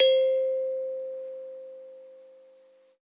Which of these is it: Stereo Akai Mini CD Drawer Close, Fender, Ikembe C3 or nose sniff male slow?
Ikembe C3